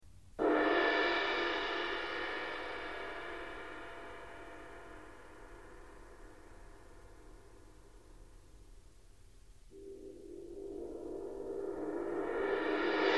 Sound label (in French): cymbales baguettes